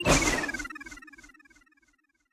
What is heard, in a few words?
Break Magic; Going Under